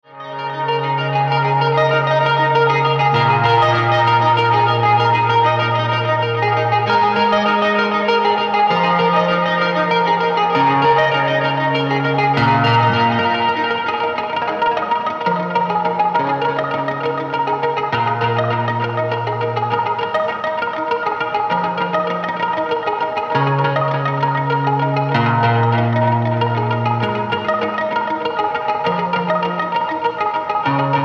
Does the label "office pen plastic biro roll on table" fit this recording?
No